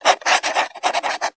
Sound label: writing